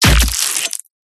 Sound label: CINEMATIC STABS; DESIGNED; PMSFX